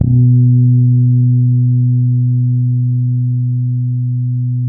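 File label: B 2, fretless harmonics, bass; Spectrasonics; Bass Legends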